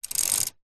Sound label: vehicles bicycle pedal backwards short wheel hub clicks